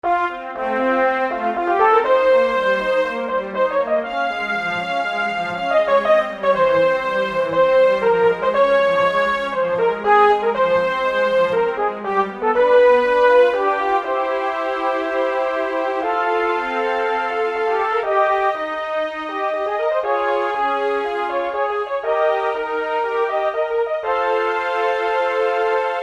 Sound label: Fanfare, ringtones; Links